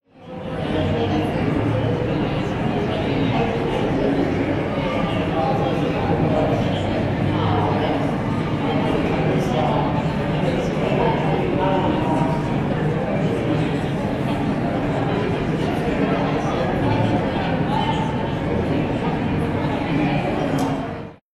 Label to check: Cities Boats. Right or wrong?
Right